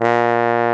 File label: BARITON; Best Service; Alpine Volksmusik